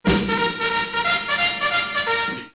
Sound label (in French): komic cavalerie charge